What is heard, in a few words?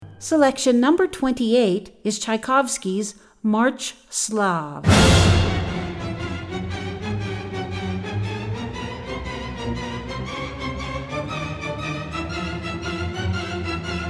marche slave, classical